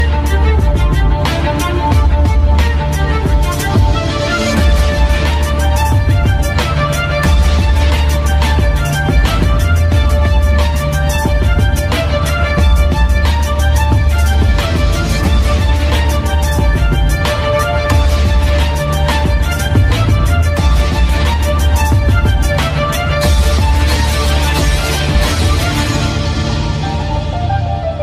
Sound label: best killing music ringtone, english ringtones